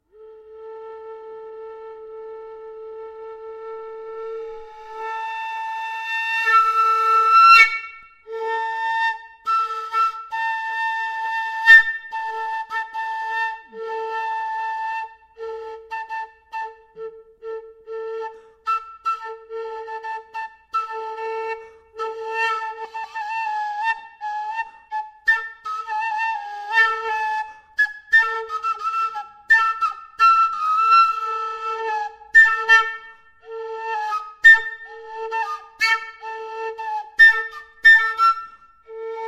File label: kaval roserwood